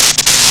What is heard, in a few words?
HELMET SOUND, Science Fiction; Mower Man